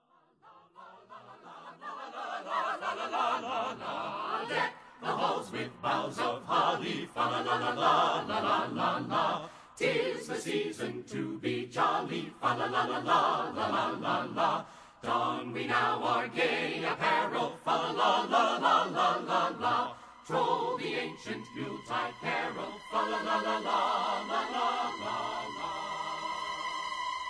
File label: Unsettling Xmas Song